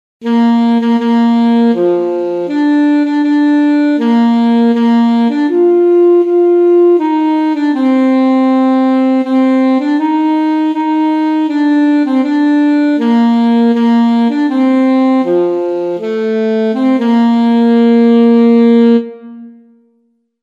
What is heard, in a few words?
alto saxophone; oh my darling clementine